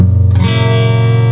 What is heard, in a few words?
GUITAR; psion cd vol1